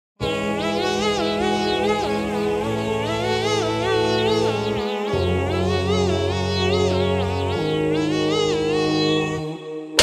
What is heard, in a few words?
beat box; بیت باکس